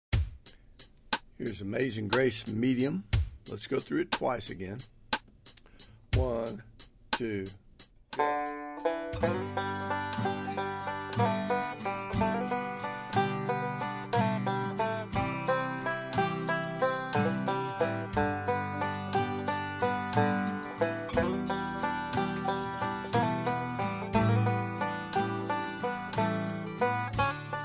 Banjo

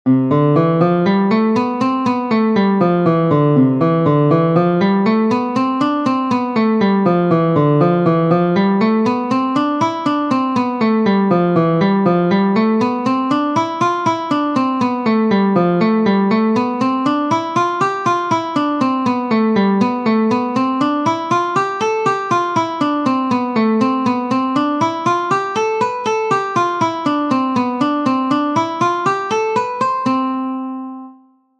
Scales and Modes Guitar C; Scales and Modes: Guitar C Major

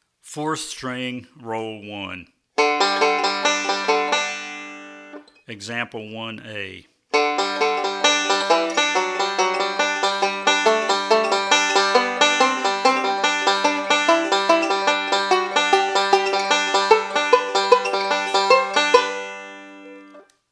Rolls For Melody On 4th String

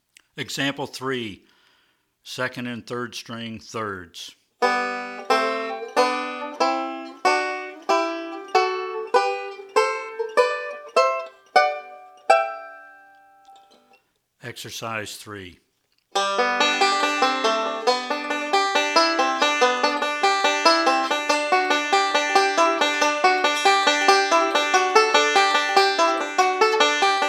Thirds On The 2nd And 3rd Strings

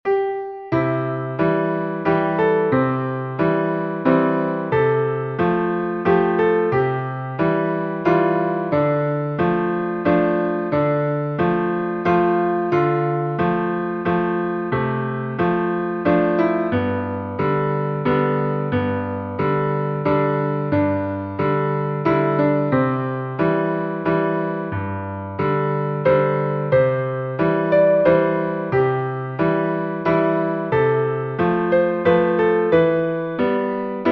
Piano; 2nd Grade

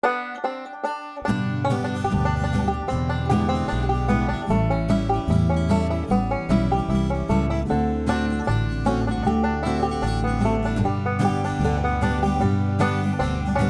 5 String Banjo Natural Style

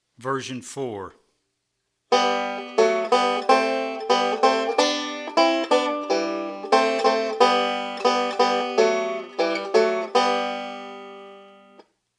Using Thirds And Sixths

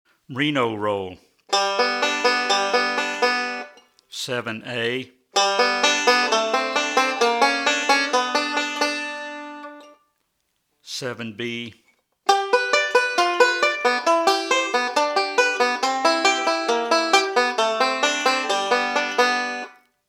Reno Roll

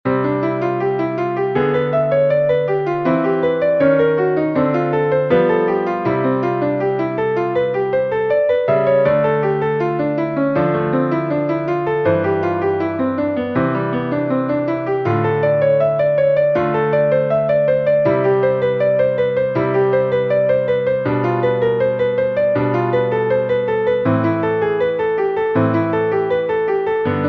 Jazz Exercise

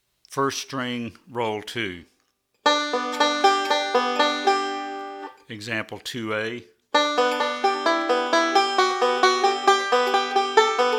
Rolls For Melody On 2nd String

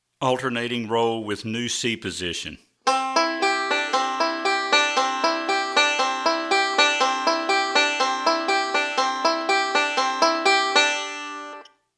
New C Position

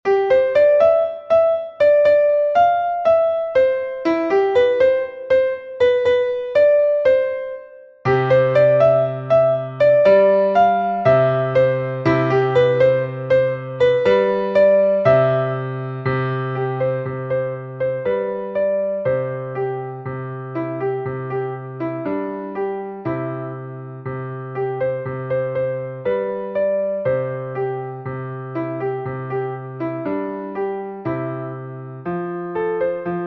Piano Easy

